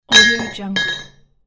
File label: metal drop